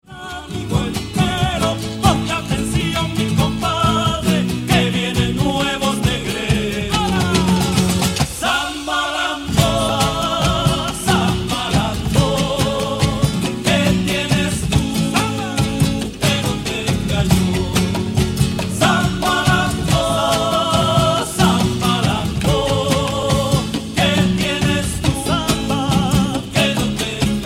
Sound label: samba